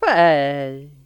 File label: faaeeehhh